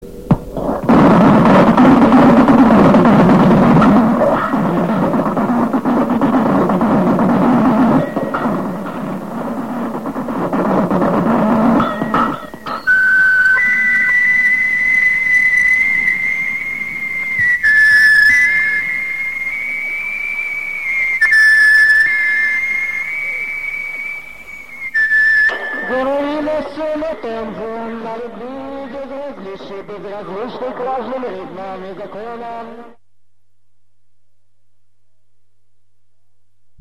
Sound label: instrumental; thrash avantgarde